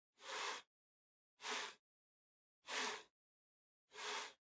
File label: PC-Mouse-Move (Far-Hard Pad-Mid)